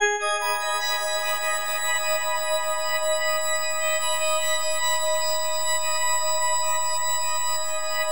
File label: FM G# MIN; Bolder Sounds